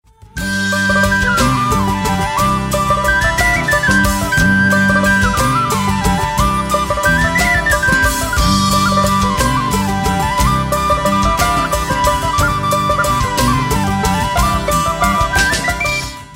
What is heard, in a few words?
Jolly Music